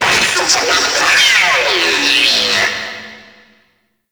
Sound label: Necramech Friendly Hack Vocal; Warframe; Entrati